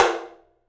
TOM, Studio Toms; 3D Percussives

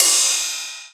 Rides, Cymbals; Vengeance; Essential Clubsounds Vol.4

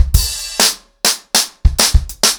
100BPM, ACOUSTIC DRUMS

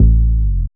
SYNTH, Synth Bass; BA1 Synth-Basses; Sound & Vision - Gigapack I CD 2

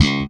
Bass, pull C#2; Sampleheads; Will Lee Bass Library

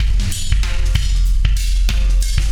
Wormhole Beat, Processed Drumkits

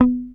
HOFNER MUTE, Bass

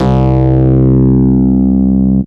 Bass Note C

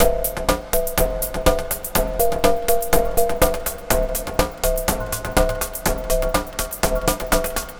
SHORTWAVE GROOVE; FRENETIC FRACTAL GROOVES

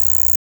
Electro Percussion, House; Drums

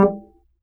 D STRING UPRIGHT BASS, Upright Acoustic Bass

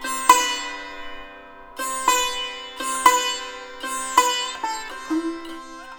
SITAR, Indian Traditions; USB Soundscan vol.45; AKAI

